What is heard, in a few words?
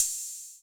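Open Hi-Hat 808 Color B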